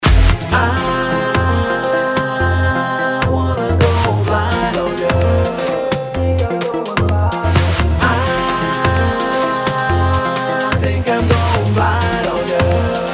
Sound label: Blind on you music